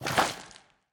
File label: kraken slurp; smite; poseidon